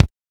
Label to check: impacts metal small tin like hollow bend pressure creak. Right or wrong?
Wrong